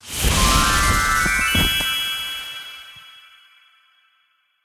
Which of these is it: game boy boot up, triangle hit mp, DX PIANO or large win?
large win